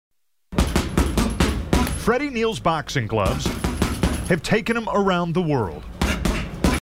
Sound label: gloves hitting bag